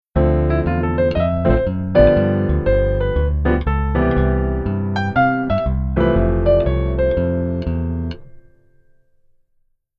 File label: Keyboard Solo Right Hand